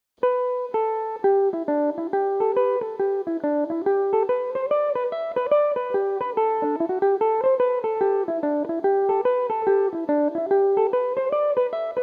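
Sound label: G major, Irish mandolin; Mickey Callaghan's Fancy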